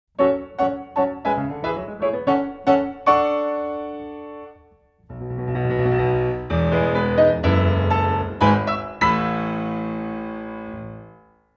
Results Announcement ~Victory - Failure~, piano solo; bungo and alchemist piano solo collection